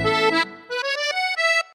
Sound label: bell